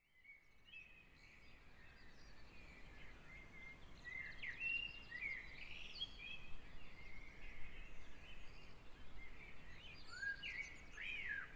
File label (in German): Sommer Amsel am Morgen